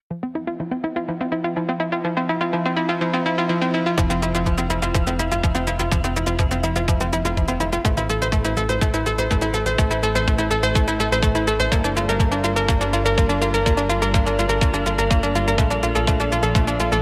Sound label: Electronic Impulse